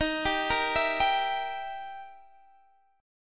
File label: E Minor Major 7th Flat 5th Eb